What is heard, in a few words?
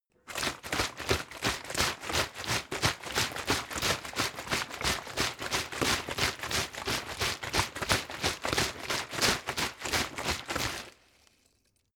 bottle water sparse shake slow; gastronomie; berufe